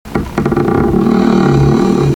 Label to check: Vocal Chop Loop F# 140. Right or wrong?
Wrong